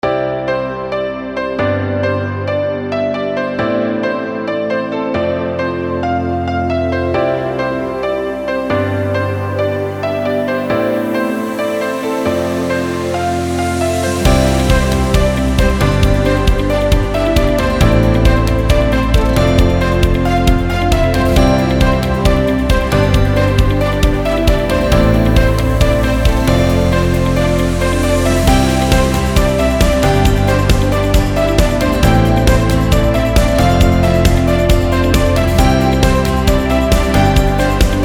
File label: Upbeat Corporate Advertising